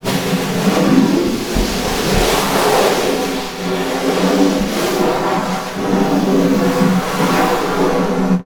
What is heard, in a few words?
Snare Drum; Percussion Samples